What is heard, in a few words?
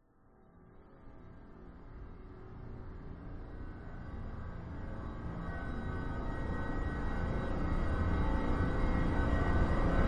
horror swell drone slow long dramatic menacing scary chilling